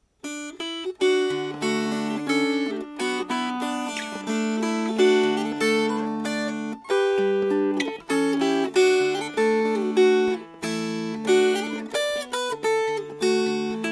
Fingerpicked

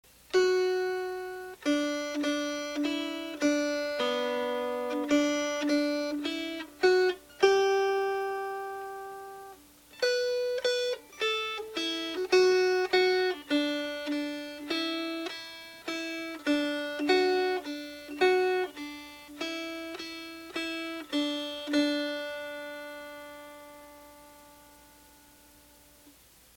Melody

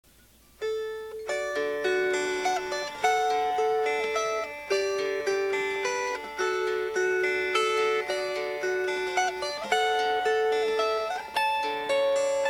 Fingerpicked

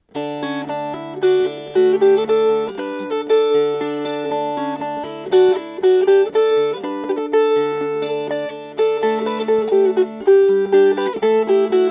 Shepherds Came to Bethlehem, Fingerpicked

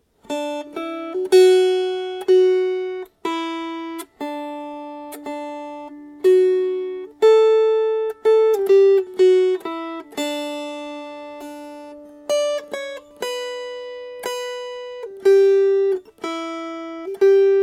Melody

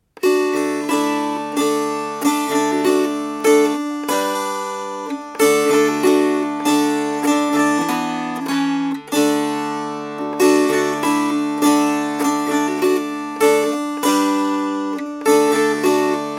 Strummed

